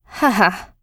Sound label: laugh; Eternal Return; Katja; ENG